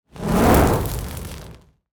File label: fire whoosh big fireball designed distorted; soundmorph